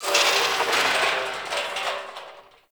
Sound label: Metal Scrapes; Ambience